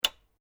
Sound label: Switch Light Wall Mount Off